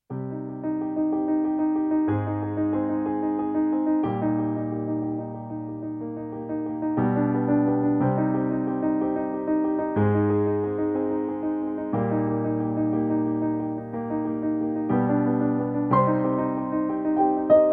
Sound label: piano journey